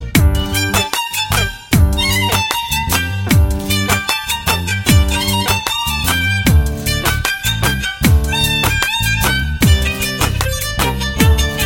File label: bgm